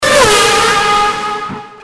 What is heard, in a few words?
flyby; valve; dutch